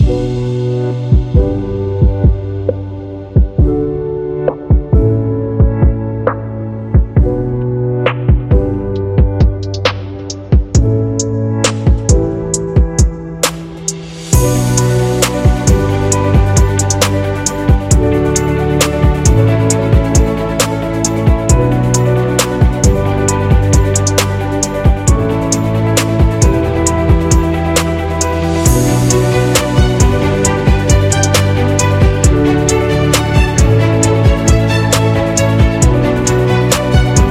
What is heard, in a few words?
Inspiring Loop